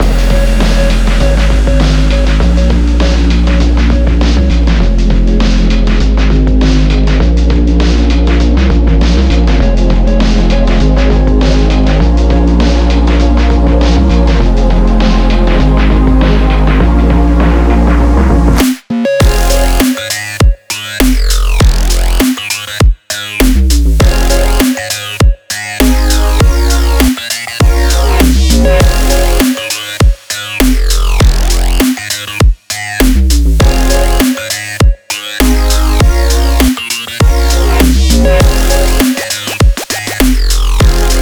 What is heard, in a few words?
glitch hop; Black Octopus Sound; Grid Division